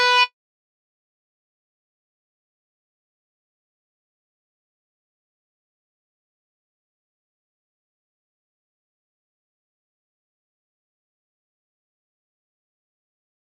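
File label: karaoke example guitar only; DELTARUNE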